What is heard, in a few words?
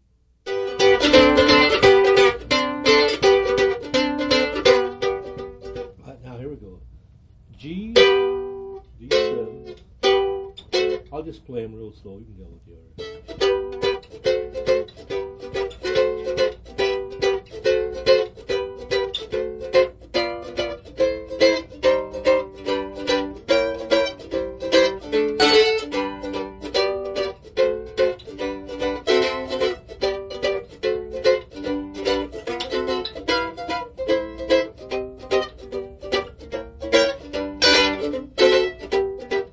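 Chords, mandolin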